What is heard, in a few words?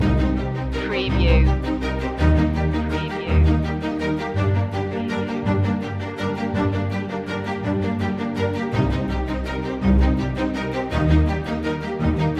stirring times loop